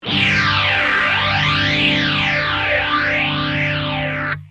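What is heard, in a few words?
guitar rph10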